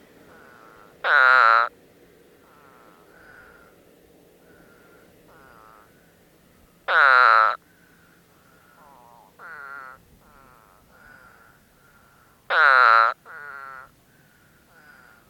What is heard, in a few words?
Eastern Spadefoot; Active: Spring; Amphibians; Spadefoot Toad; Active: Early spring